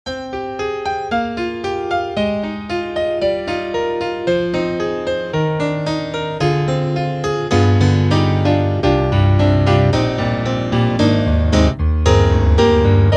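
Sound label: LA Custom C7 Stage, piano; Spectrasonics; Keyscape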